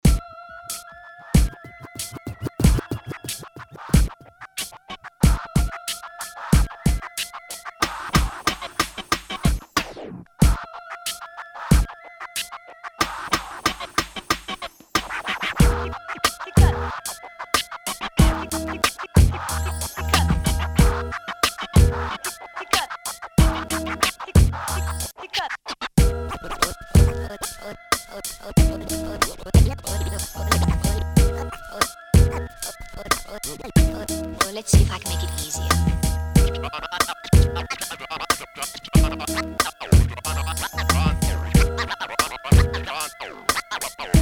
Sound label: beat